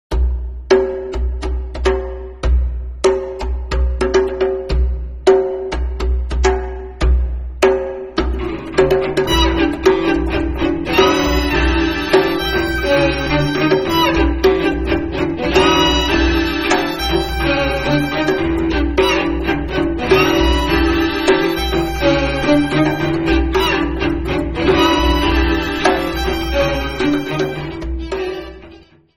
stringquartet

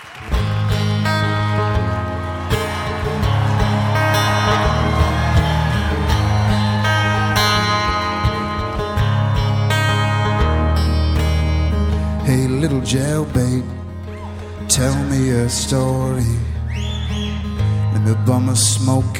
solo

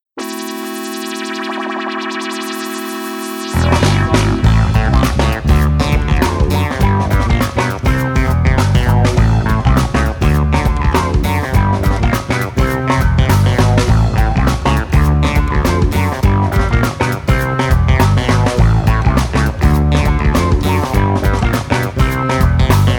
creep funk

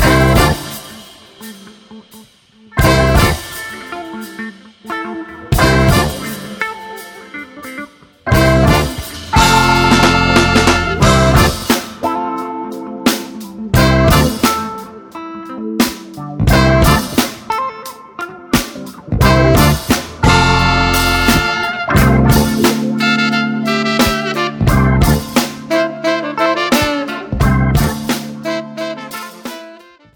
funky

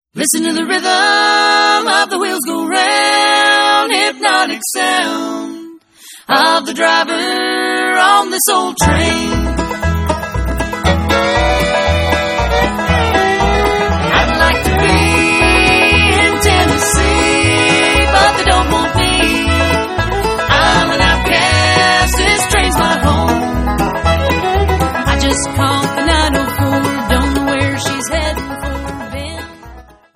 bluegrass

